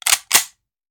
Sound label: Machine Gun Foley; Sound Ideas; Guns